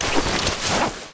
cloth equip

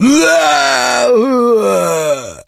zombie take damage

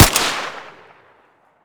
rpk fire suppressed; ak74; weapons; cw ak74

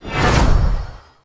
battle summon; macha

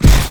lunge; snds jack gmod

